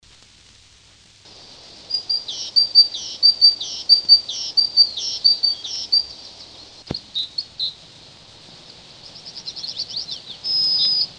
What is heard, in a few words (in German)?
Kohlmeise; Vogel; hoerstation vogel wg platte 2